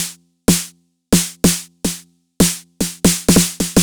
drum ninety pop percussion 125, Drum Loops, Classic Chicago House